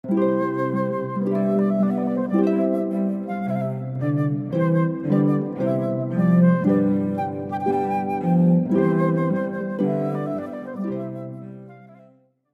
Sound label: Trumpet Voluntary, harp flute cello; Clarke